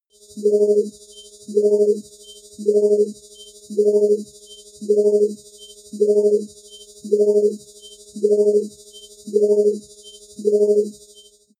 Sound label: sci-fi scanner